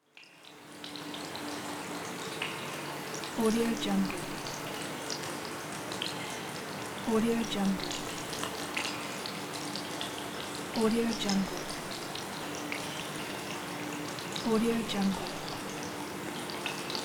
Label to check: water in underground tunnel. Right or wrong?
Right